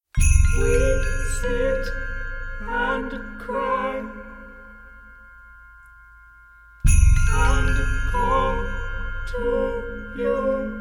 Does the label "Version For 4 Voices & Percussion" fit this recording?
Yes